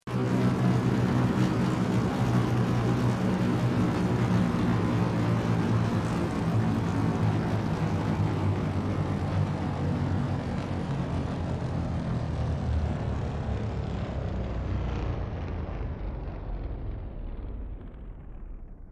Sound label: Industrial Ambience SciFi Machine Room Power Down, Industrial Ambience Scifi Machine Room Generator Power Down; Factory; Backgrounds